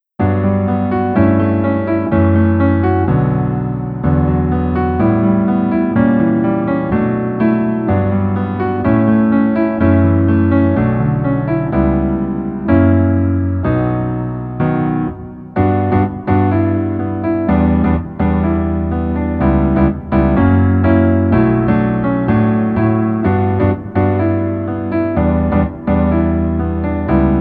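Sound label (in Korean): Piano version 남자키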